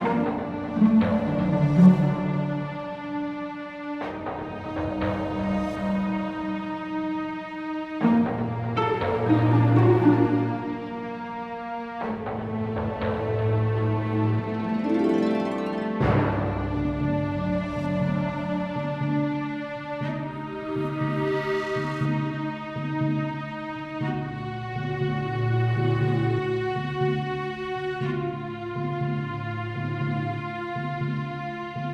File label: Dungeon Level loop; Rezrog